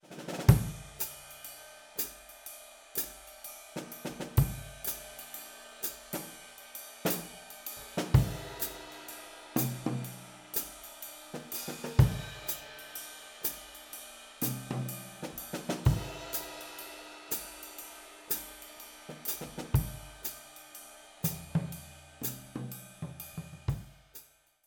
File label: Drums; TLM 103